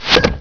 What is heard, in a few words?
Weapon Pickup; PowerPete Demo